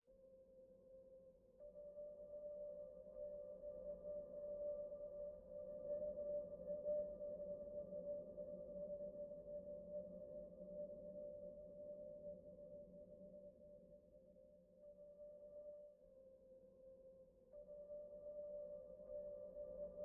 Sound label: Texture Neutral Silence Calm